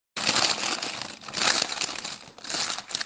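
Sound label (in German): Brottüte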